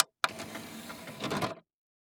Electronic Machine Wood CD Player CD Drive Closing; AdobeCC